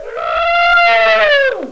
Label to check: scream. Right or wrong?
Right